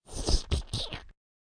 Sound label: Blod Sqrt, Sounding of blood squirting from wound.; Injury Sound Effects; Combat